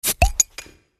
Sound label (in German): plopp